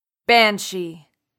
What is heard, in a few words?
banshee; 5etools; bestiary